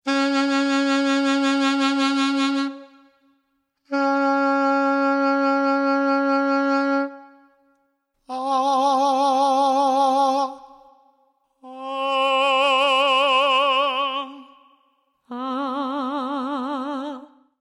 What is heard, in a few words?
Sax and Voice Comparison